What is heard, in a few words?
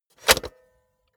cassette player slide tray insert soft